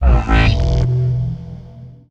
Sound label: Eyeball Power Up